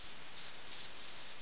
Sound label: swamp